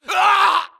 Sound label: grunt death crush; Titanfall 2